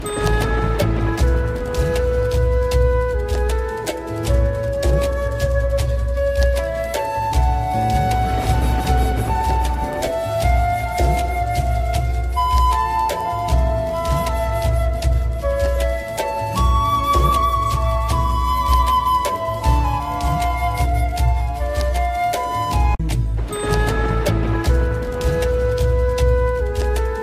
sad romantic love ringtone, bollywood

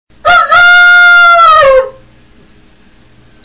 rooster ringtone, funny ringtones

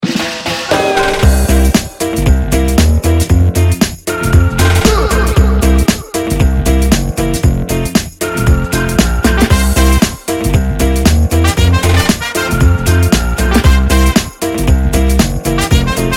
reggae music tone ringtone; nokia ringtones

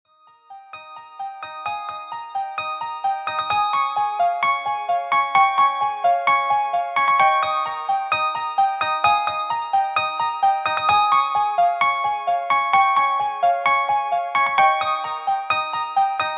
english fast music ringtone

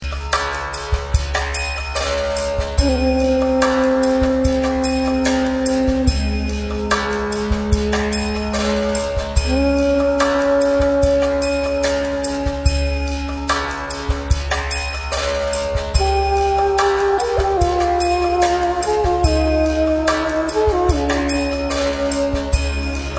sad ringtone

